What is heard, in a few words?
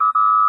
voice input, computer; federation